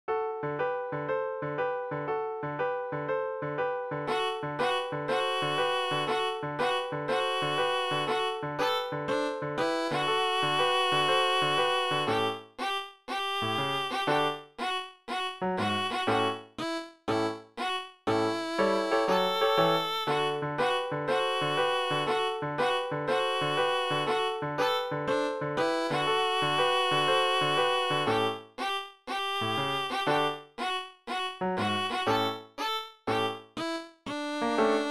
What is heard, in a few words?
jingle boogie violin